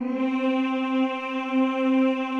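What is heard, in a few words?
choir C3, Men-Combi; Sound & Vision; Gigapack I CD 2; CHO Mmhh + Rrrr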